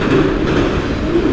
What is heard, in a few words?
train, chicago